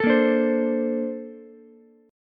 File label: Bb Major 9th, Bb, down, Ukulele, C6; strummed; JGuitar